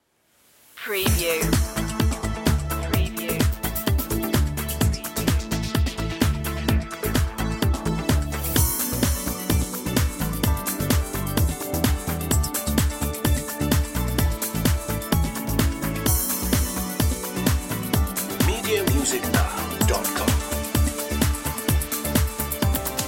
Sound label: positive uplifting